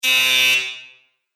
Door Security Buzz With Reverb, Doorbell Sound Effects